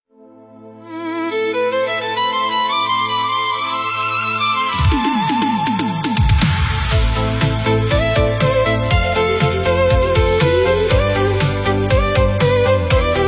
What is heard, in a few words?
italo disco